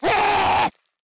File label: goblin hit